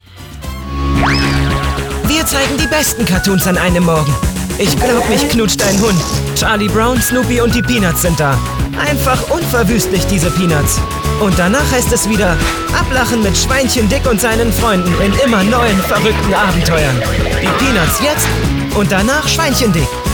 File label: Jingle; Sat1 Junior Morgen